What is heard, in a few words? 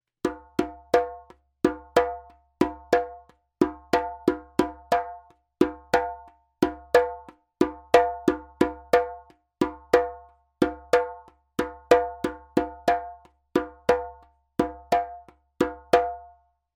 djembe; djembebog oevelse